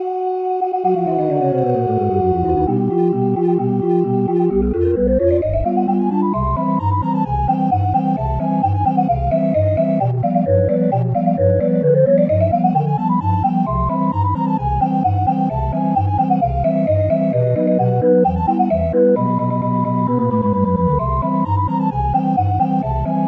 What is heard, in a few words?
circus steam calliope